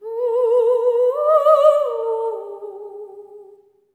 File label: LAMENT